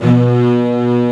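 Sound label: Kurzweil E3, strings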